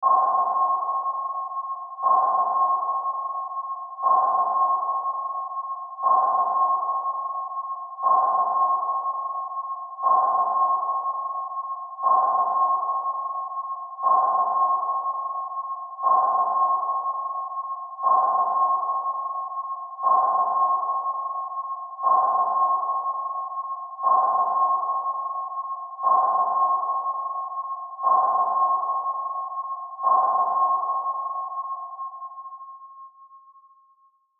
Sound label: Submarine sonar ping multiple; BLASTWAVEFX